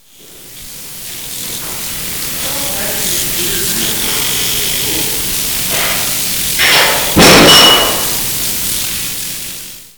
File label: door close